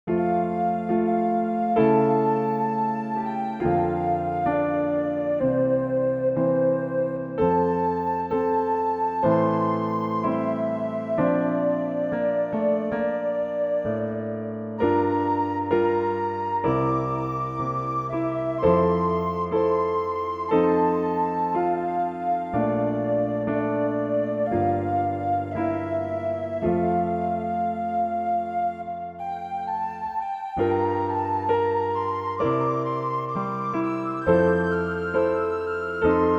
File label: Hymn, Organteq flutes